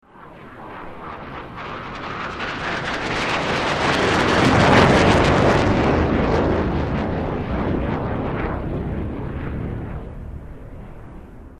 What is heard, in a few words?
f5 tiger; laerm